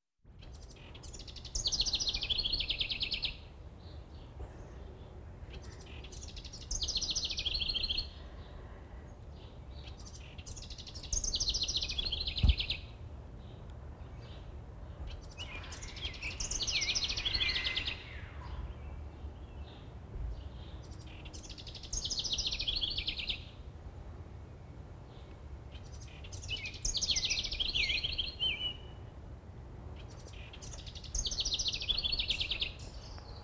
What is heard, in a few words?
Wrens Singing (some background noise); Electa Quinney